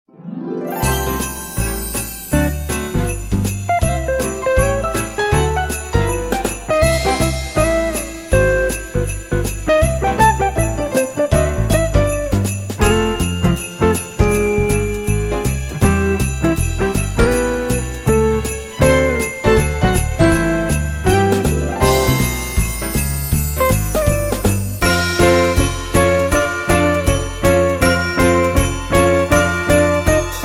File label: Jingle Jazz